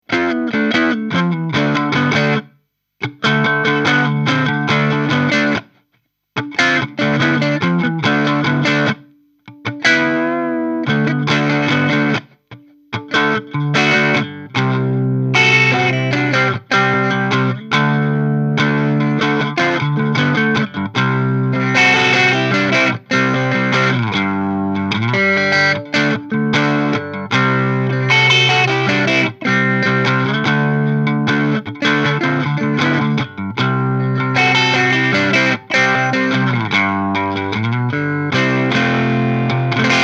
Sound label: IT 11 Deluxe Light Crunch Telecaster